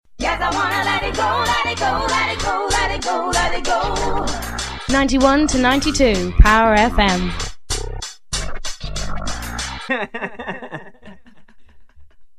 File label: laugh; jingles